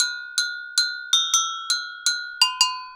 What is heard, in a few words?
agogo 80 bpm, latin